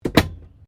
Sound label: CAR HOLDEN FE GLOVEBOX CLOSE, Classic Australian cars; Cars (Sound Effects:Vehicles)